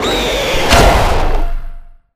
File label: critters jumpscare; nightmare criters